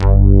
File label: FAT BASS, JV 1080; Pro-Rec - Synthsonic